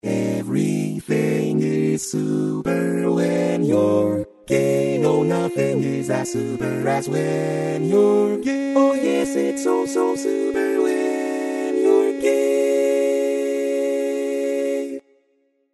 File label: Bass, barbershop tags